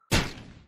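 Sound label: Pulse Grenade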